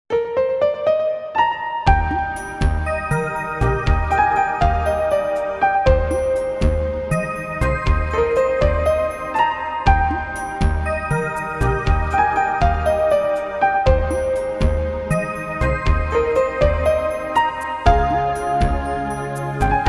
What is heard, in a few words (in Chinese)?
纯音乐背景音乐